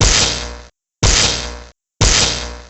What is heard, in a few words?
taser